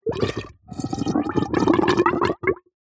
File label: water pipe gurgle; nature